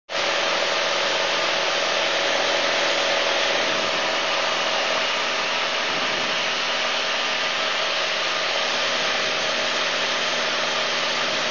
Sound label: engine, engine knocking sound